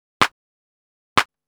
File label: CLAP DISCO 125; Drum